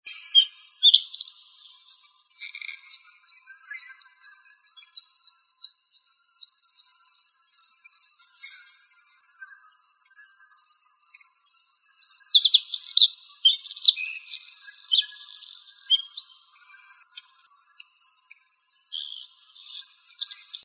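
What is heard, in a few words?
bluethroat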